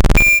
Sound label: tank spawn; tanks of freedom; units